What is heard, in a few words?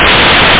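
hi hat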